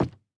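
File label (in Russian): одиночный быстрый стук в деревянную дверь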